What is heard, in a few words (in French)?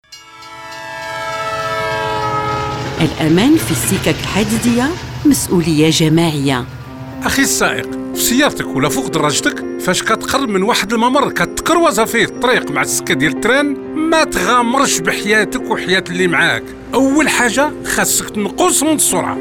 passage a niveau, maroc